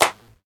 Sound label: hand clap G# key, Royality free clap tuned to the G# note. Loudest frequency: 2884Hz; percussion; single hits one shots